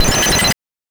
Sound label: BANK2 SFX, NES DPCM; MIDINES